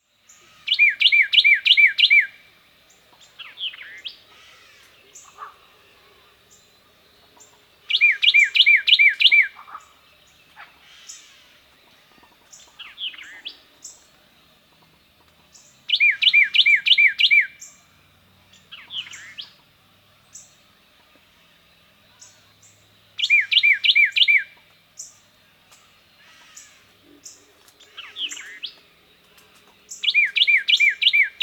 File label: carolina wren